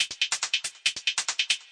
140 verby click closed hats, drum loops and breaks